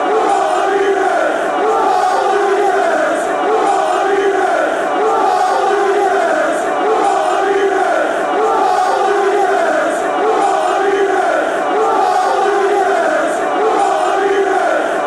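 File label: come you reds, football chants; sufc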